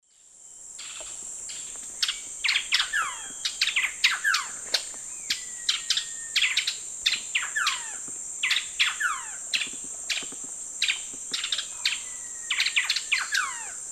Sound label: Pheugopedius coraya, calls pair and song; S. America; Eastern Lowlands